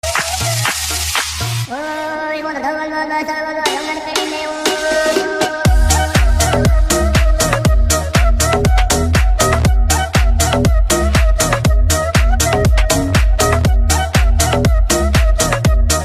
arabian ringtone

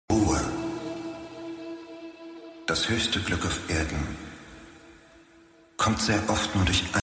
ringtone

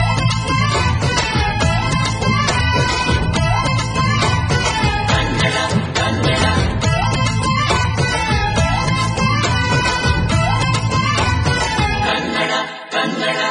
kannada ring tone

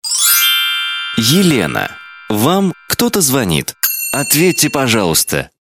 notification ringtone

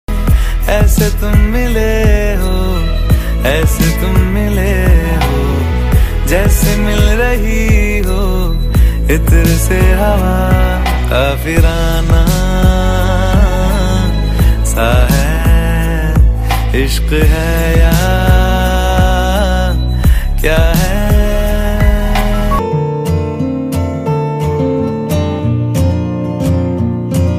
bollywood ringtone

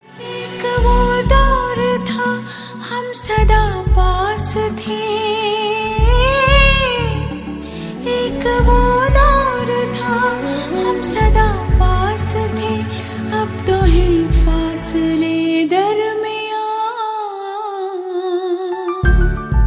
hindi sweet love ringtone

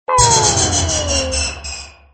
notification ringtone

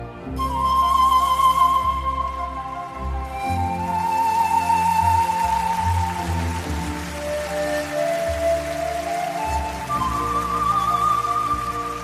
best fluiit music ringtone

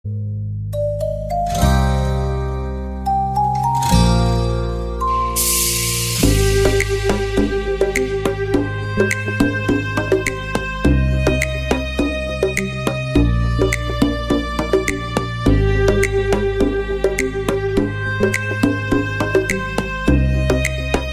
arabic instrumental ringtone

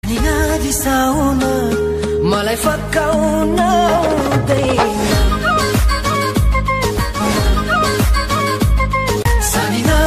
nepali ringtone

